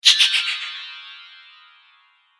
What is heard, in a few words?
Chime Whoosh; Whoosh Sound Effects; sound design